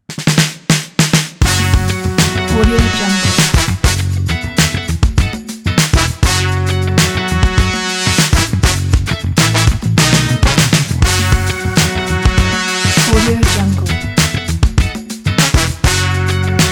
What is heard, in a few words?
funky intro opener; tonydrumer